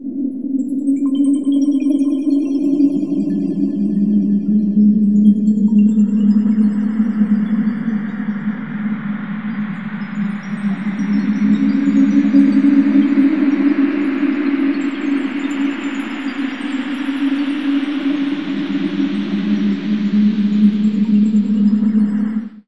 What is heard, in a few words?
amb, Alien Ambi, Chillout; Best Service ProSamples vol.36